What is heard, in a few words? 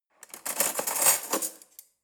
cutlery forks drawer grab soft